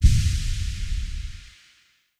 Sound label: blue room orb final boom